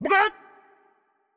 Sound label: Chicken